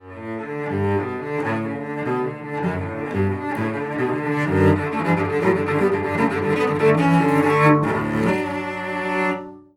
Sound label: Cello